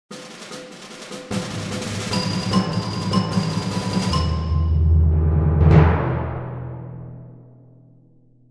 Staccatos percu

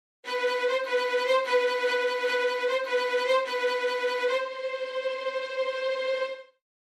Staccatos cordes

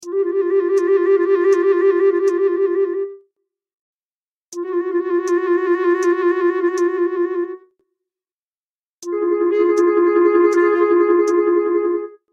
trilles

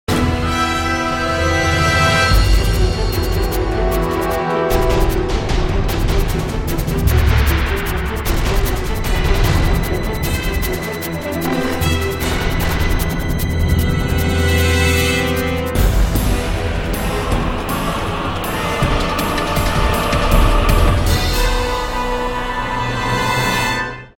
percu

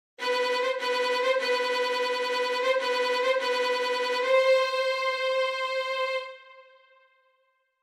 Staccatos cordes machine

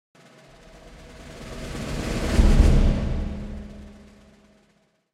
crescendo sombre

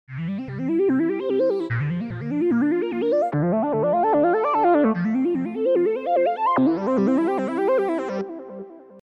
mono synthé

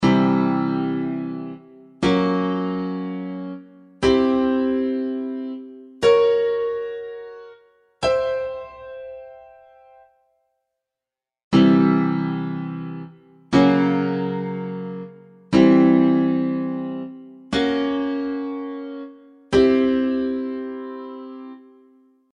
intervalle triton

